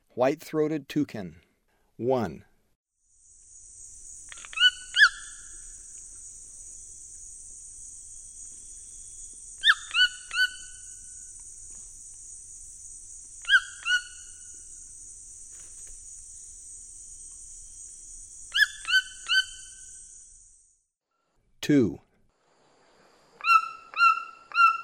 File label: White-throated Toucan; S. America; Eastern Lowlands